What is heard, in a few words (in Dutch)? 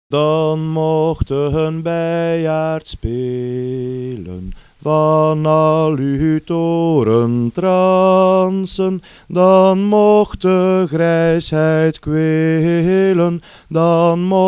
Beiaardlied; Folklore